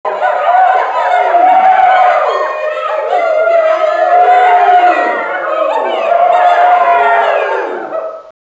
crowd; Virtual Pool Tournament Edition

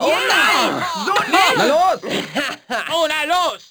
miss, Crowd German

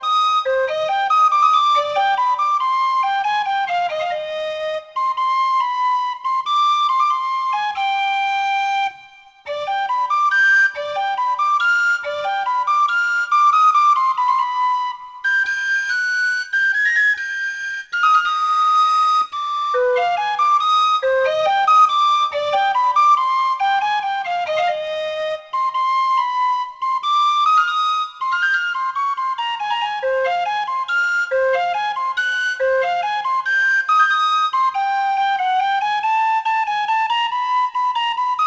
town merchant; Sid Meiers Pirates; XBOX

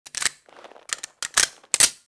reload beretta; weapons; Max Payne

